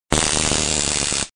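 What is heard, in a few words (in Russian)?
звук электрошокера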